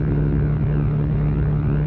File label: forcefield, ambience